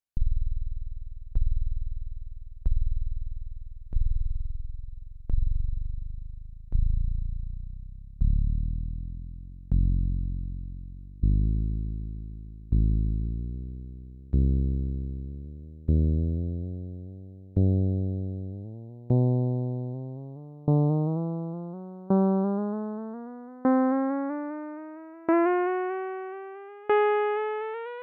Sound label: Korg Volcadrum Saw Pitch